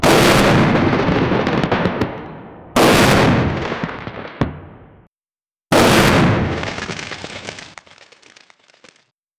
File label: firework sound effects